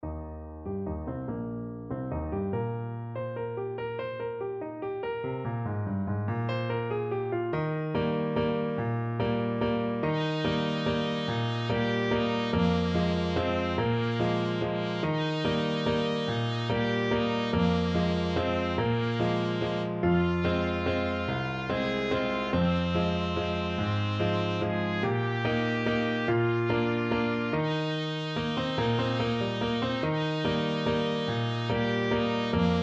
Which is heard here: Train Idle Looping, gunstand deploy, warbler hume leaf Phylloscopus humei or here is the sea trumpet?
here is the sea trumpet